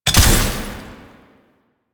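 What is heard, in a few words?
Invisibility Projectile Activate; Warframe; Quests; The New War; Drifter; Abilities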